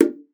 FINGA, BONGOS CONGA; Best Service ProSamples vol.42 - Session Instruments

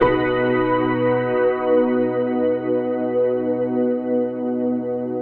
Big B Chord, Drum & Bass

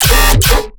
bass hit D, Bass Stabs; demons3; Virtual Riot

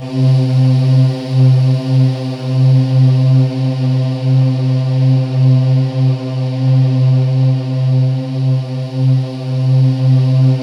C2, Synth Leads

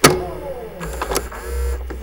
MACHINES

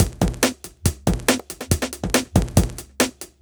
Break Beats, 140 BPM

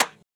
Clap, Drum Percussion Single Hits

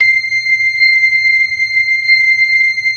LO MAN V L, ORGAN LOWER MANUAL; Keith Emerson Signature Series

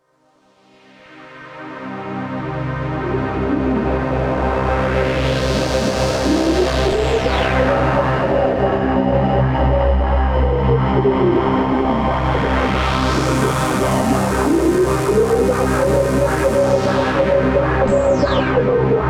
Atmosphere; AMG - Prototype Drum & Bass

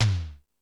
Toms, E-MU Pro-Cussion

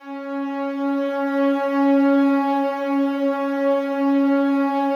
slow strings C#3, Yamaha VP-1; 90 s Sample CDs; Music Store

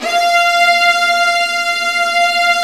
Violas Bright, String Sections; Roland LCDP13

